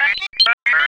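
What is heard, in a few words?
sphere blips large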